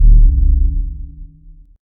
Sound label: dark eerie ui completion